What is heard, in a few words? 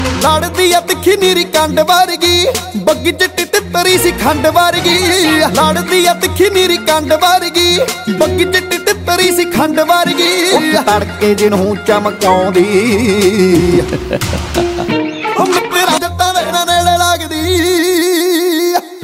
Punjabi Song Ringtone